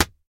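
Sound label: Dictionary Slam Close, books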